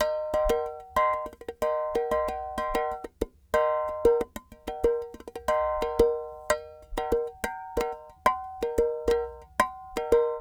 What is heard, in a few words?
clay pot, world percussion